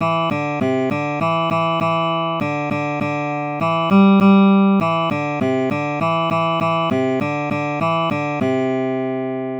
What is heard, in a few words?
picked nodamp